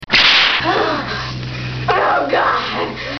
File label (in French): son fouet